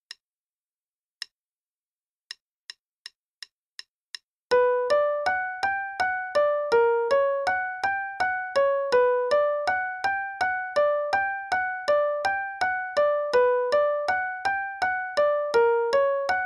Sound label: Play-along (dq=55); broadway